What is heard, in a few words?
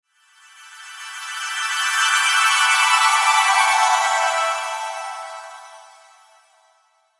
SHIMMER DELAY, Shimmer Delay Thick High; Shimmer Delay Thick Descend Phaser; High Tone